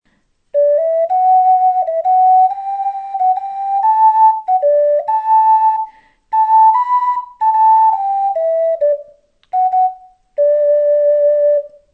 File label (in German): d Okarina